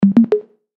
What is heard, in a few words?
Chime Musical, Chime Musical Multimedia; Web Interactive; Chimes (Sound Effects:Multimedia)